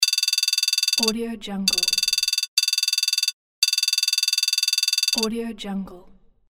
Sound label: digital text